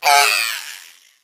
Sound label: egg pain